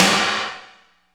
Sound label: SNR, Tekno Industrial; East West